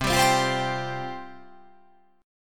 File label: C Suspended 4th C